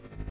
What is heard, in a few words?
buzz